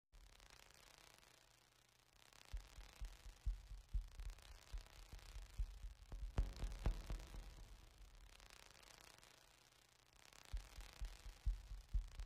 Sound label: Noise